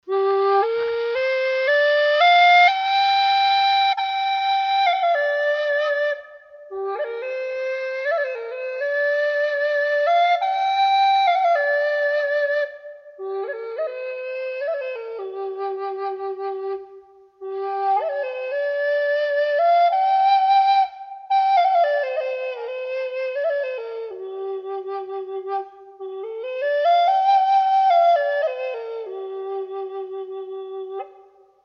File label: handmade western cedar native american flutes